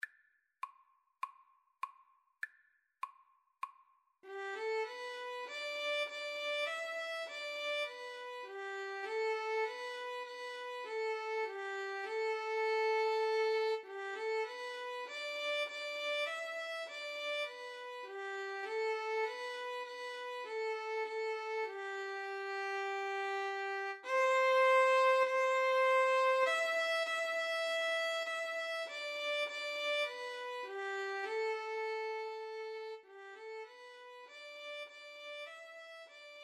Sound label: Violin Cello Duet